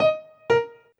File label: count down; lila